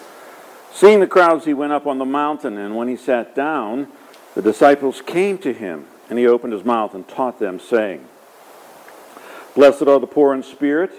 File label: Gospel